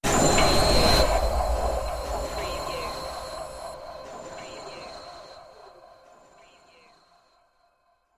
sudden extraterrestrial appearance

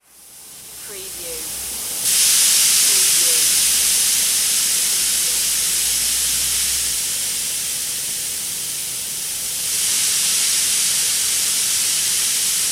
Blowpipe

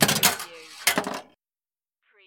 Pinball sound, ball launched, rolls and clanks

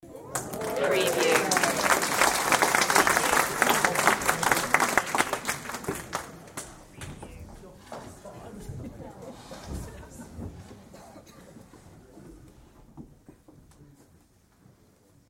Audience Short Cheer and Applause; beanstalk audio

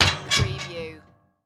Garbage Can Lid Open

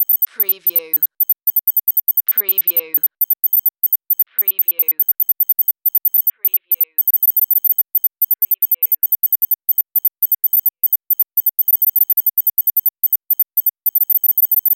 Computer Readout

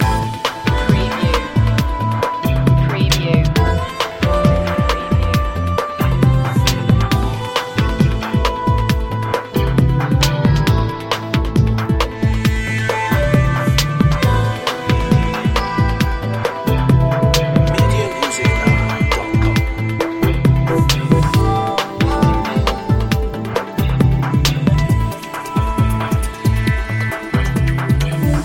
in a good mood loop

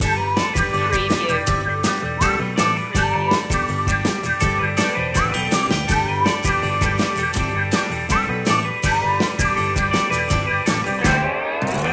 upbeatful

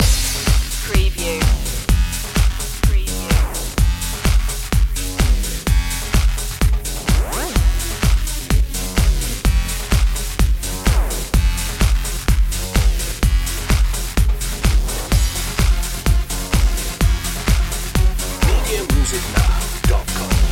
starship dance loop

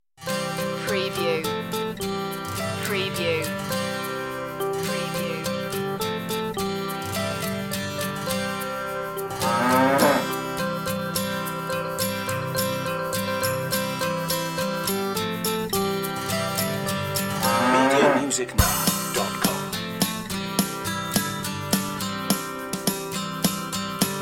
old macdonald had a farm instr

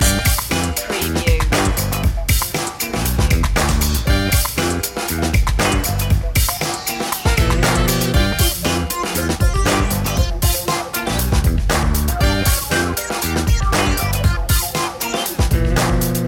funky bag loop

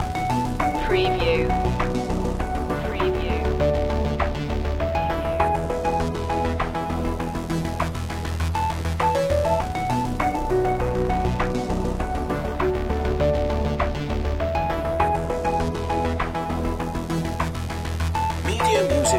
forward thinking loop

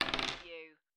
Dice Throw One Die On Wood Table

